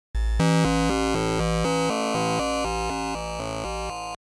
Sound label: limit, LSDj Wave Synth